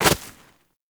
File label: Operator Walk Snow, Footsteps; Warframe